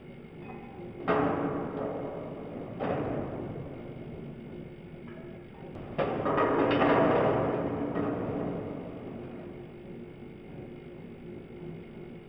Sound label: Pipe Machine